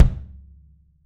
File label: KICK, Drums; 3D Sound; AXIS KICK